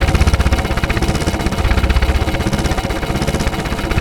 Shot; Valiant SpaceMarauder

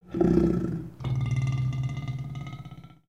Pets Lion Idle Med; creatures pets; Lego

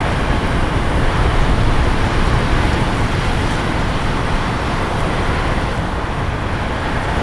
distant ocean

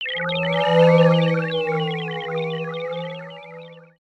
radar dish

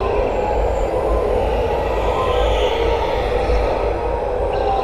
Infection Crevis Wind; ambience

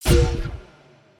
Pet Doberman Disapprove; creatures pets

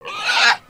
Pet Warthog Growl; creatures pets

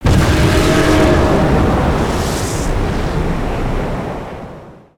Siege Tower Impact; destructibles

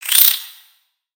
Spider Exert; creatures mechanical; Lego

